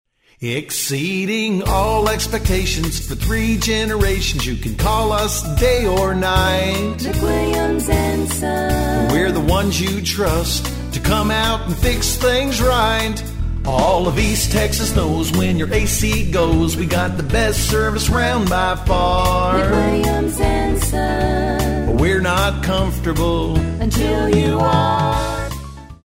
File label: Jingle; McWilliams and Son